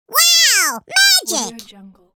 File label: cartoon cute wow magic